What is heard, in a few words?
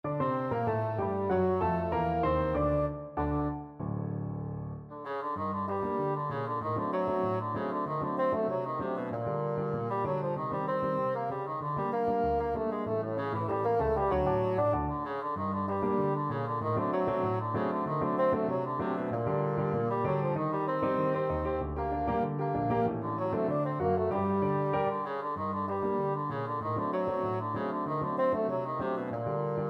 bassoon